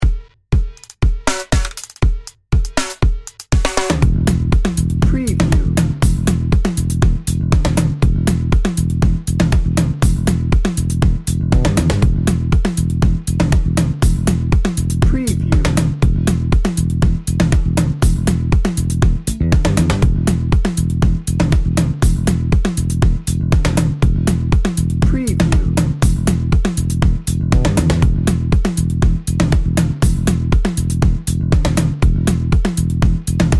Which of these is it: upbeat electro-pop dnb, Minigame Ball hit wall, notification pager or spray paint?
upbeat electro-pop dnb